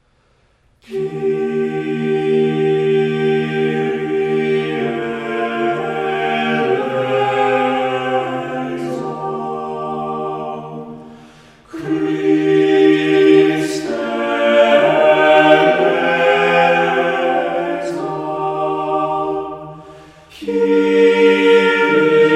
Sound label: kyrie mass, Mass for 3 voices; The opening of the Byrd 3-part mass.